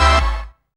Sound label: dance chord